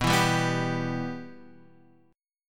B Suspended 4th Sharp 5th B

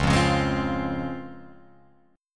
Db Major 7th Db down Guitar Open F; strummed

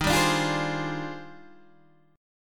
D sharp Major 13th D sharp Guitar DADGAD, chord

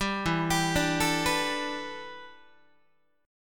E Minor 11th, Guitar; Em11 arpeggiated; JGuitar

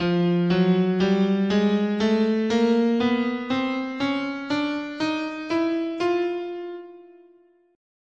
F Chromatic, scale

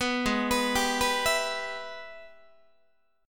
Minor Major 7th Sharp 5th, Guitar; G#mM7#5; arpeggiated; 4A,4E,5A,5D,5G#,6C#; 14,16,14,17,15,15

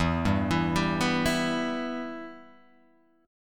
E-Minor, Guitar, Open G; 2,0,2,0,0,2

